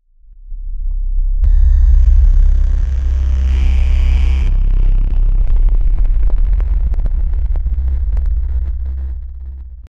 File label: chase tension deep bass